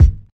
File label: 00s puffy kick drum one shot g key